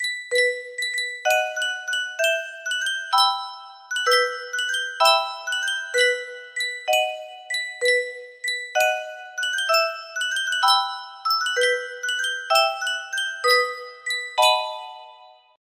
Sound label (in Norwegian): yunsheng musikkboks